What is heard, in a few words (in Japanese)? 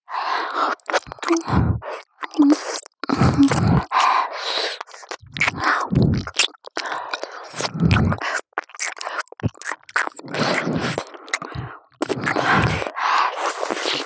友達みたいな彼女の両耳舐め ループ; SEあり